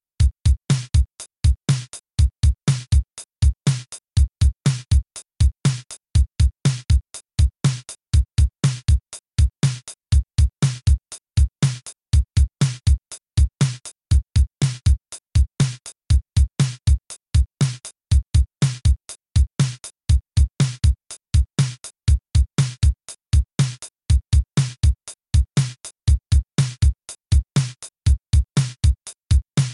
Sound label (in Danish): Starteksempel 2 en takt